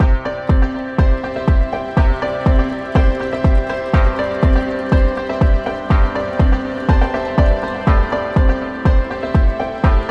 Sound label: Chinese Business